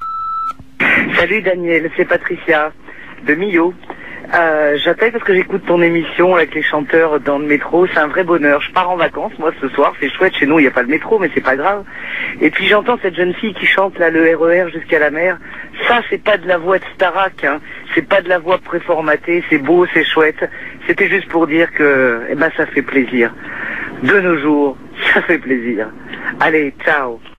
Musiciens du metro, repondeur

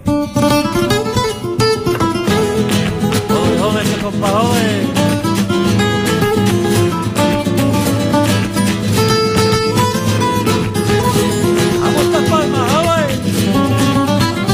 Chanson gitane

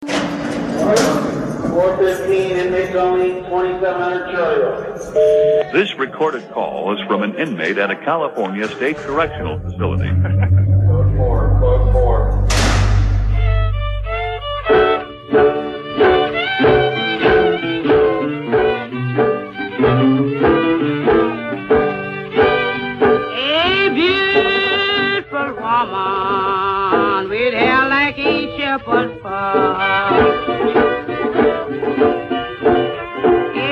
Chanson blues

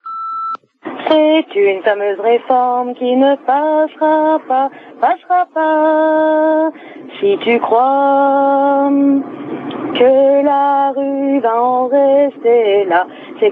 Manif retraites chanson repondeur

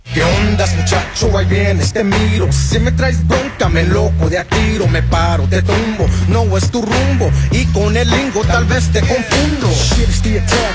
Chanson rap latino

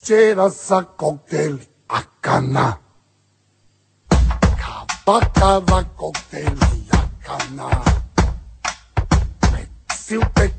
Musique

